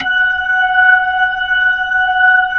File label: B-3, Jazz